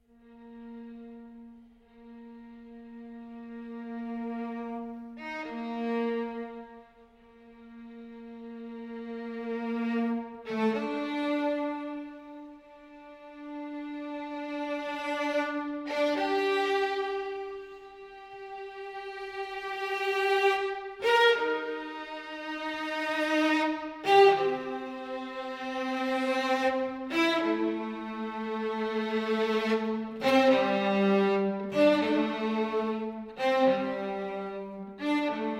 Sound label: Quartet; Britten